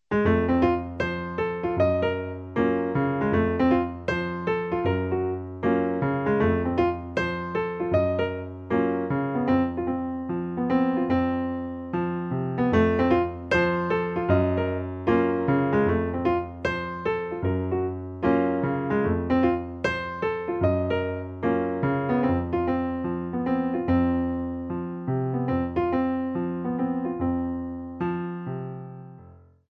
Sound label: mlody solo